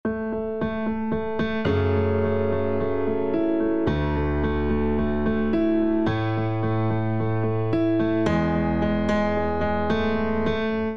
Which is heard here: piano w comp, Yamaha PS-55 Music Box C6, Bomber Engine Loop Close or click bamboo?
piano w comp